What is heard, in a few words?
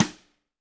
Snare, Roland TD-20